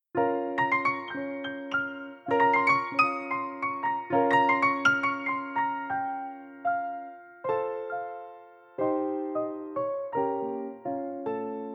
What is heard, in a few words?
A Sharp Melodic Minor